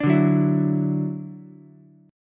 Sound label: D-Major Add 9th D down Ukulele C6; strummed; JGuitar